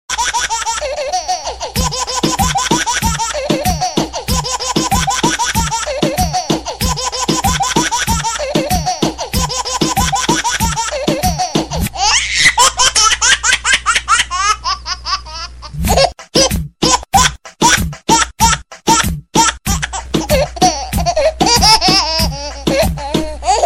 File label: disco baby laugh